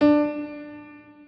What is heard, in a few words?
Piano D3